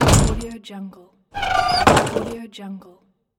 door closing

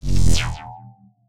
ship set close; soundmorph

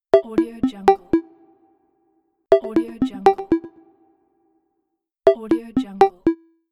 logo; jet-fx

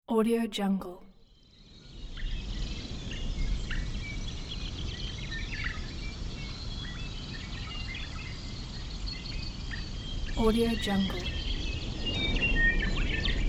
nature sounds

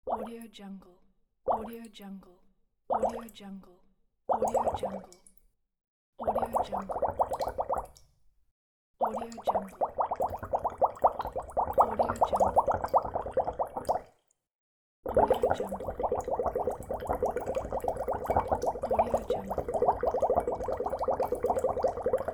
bubbles

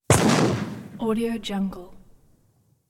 black powdered rifle firing; hollywoodedge

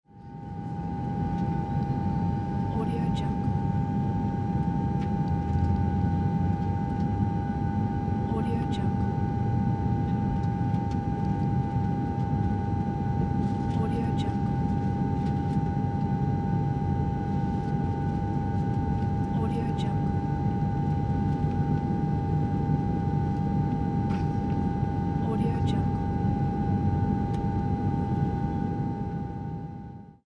aircraft cabin ambience

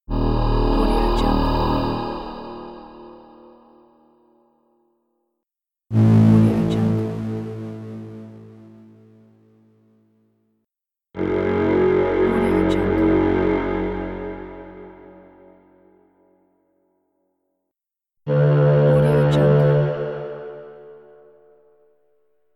suspense braaams

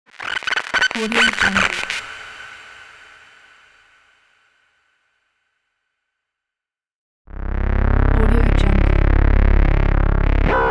glitch sample series; ufratuproject

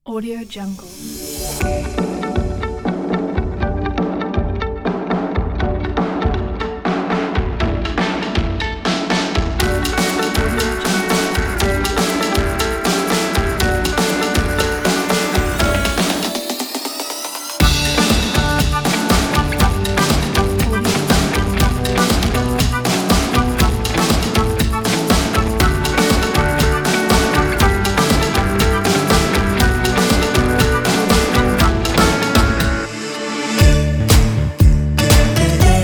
flip flop jiggy

